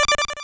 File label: sounds pause out